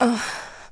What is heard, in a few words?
effort; CD Action 45 1999; Urban